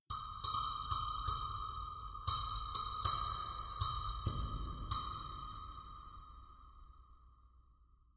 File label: ambient synth grain click